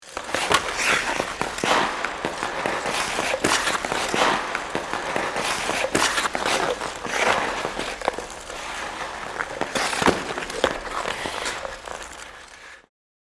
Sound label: Ice hockey players game contact impact